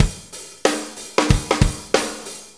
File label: Shuffle Loop, Full Kit Loops; Drum Tools; Shuffles; Sonic Foundry (Sony Creative Software); Loops & Samples Drum Tools